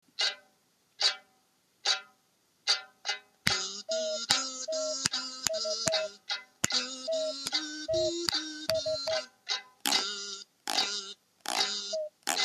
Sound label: pot gassou